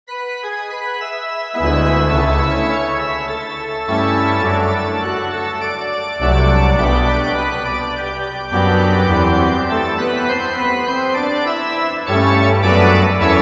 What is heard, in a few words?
largest Organteq, 8 Organteqs simultaneously; The world's largest Organteq? 8 Organteqs simultaneously.; Pianoteq